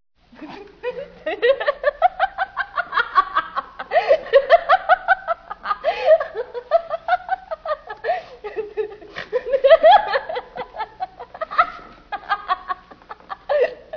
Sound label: Laughing daughter